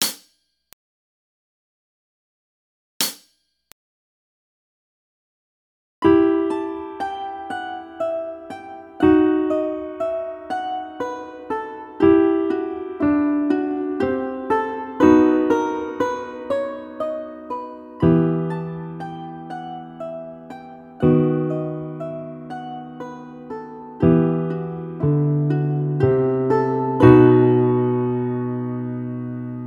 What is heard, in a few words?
Menuet, balalaika; ZipolyMenuet